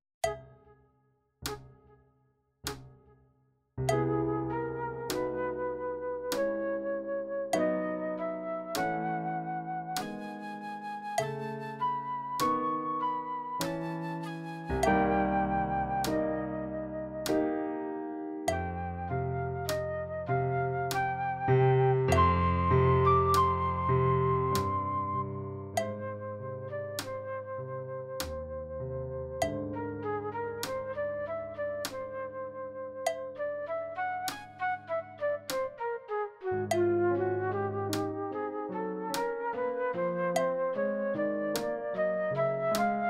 Piano Flute Medium; Uebungs-CDs; Playbacks

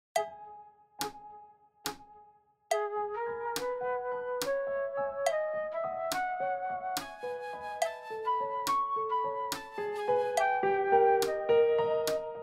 piano flute fast; Uebungs-CDs; Playbacks

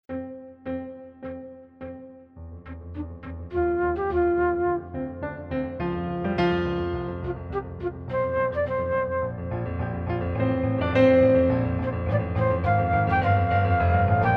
fast stereo; Uebungs-CDs; Playbacks

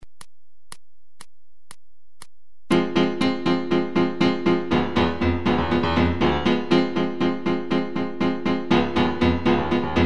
Charleston

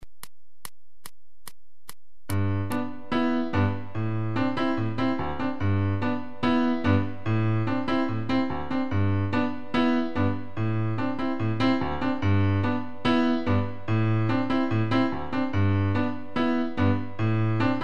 Rumba; Seiber; Rumba Bis Walzer

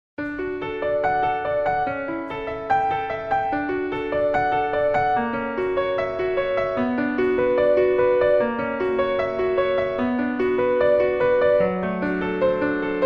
Solfeggio; CPE Bach

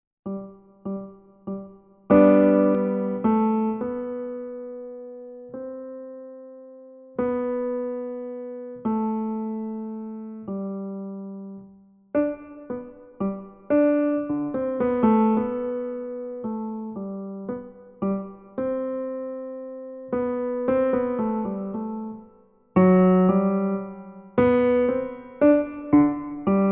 Menuet G LH mittel; Bach Johann Sebastian; Notenbuechlein Fuer Anna Magdalena

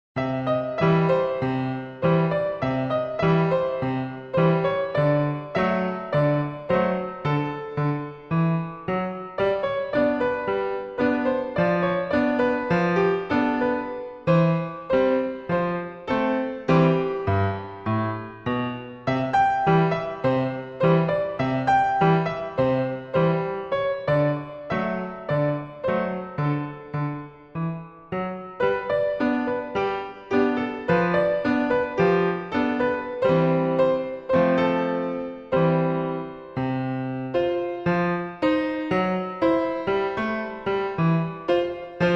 Ragtime

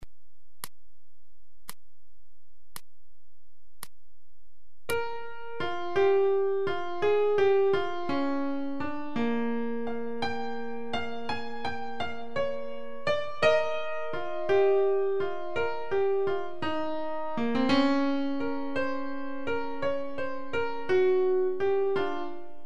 Blues 56

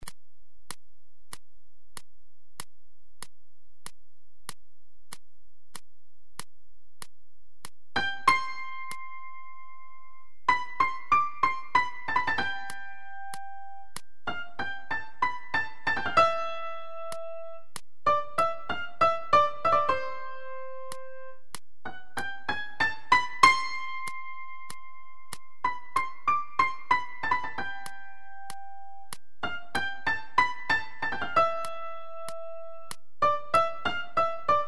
Paso doble 95

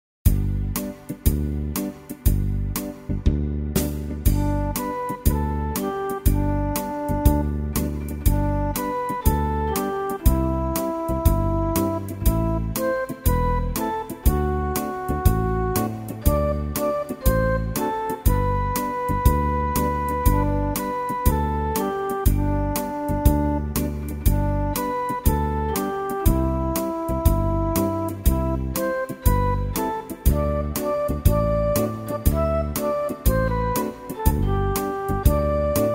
Jingle Bells

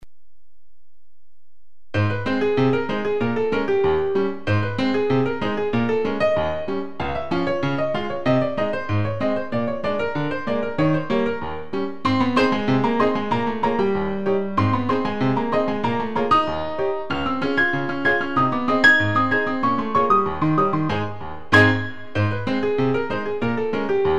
Ragtime 190

